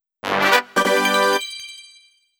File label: Balloon Popping Rate Fanfare; Segway Challenge; Wii Fit Plus platinum soundtrack 2011